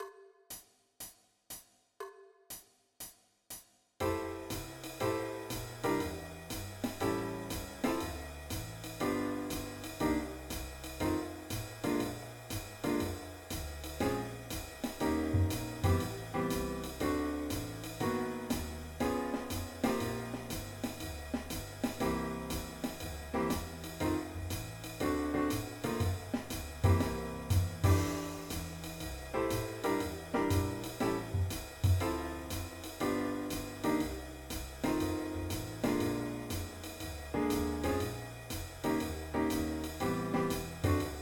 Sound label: bass accompaniment blues